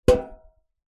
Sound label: foley tin metal cylinder lid on set down on carpet; zapsplat